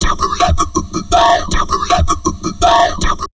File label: TALK 160, Drum Loops Crazy Processed